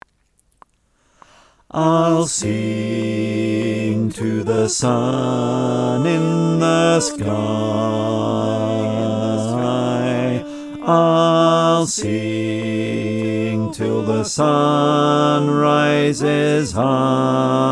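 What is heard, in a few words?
bass, choir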